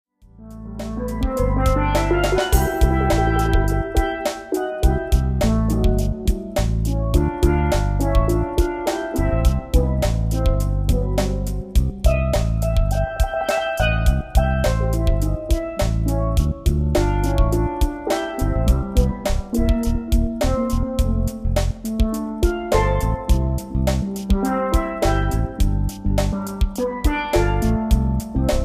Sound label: steel drum solo